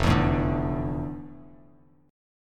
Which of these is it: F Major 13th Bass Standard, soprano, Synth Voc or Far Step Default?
F Major 13th Bass Standard